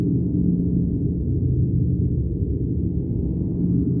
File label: sparse soundscape samples; ReLooped